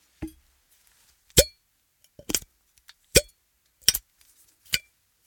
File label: Glass Bottle Open; Mechanical Wave